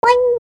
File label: boing